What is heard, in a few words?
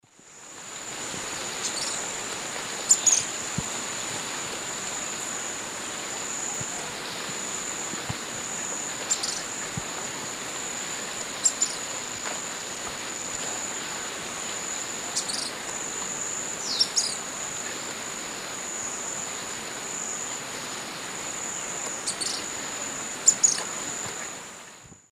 Serpophaga hypoleuca, song; S. America; Eastern Lowlands